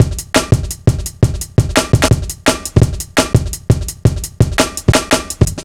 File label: Drumloops, 170bpm; Zero-G; Total Drum Bass